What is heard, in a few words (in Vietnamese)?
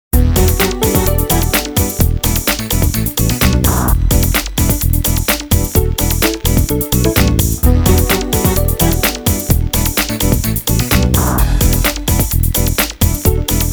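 Nhạc nền chuyển tiếp mở đầu và kết thúc hay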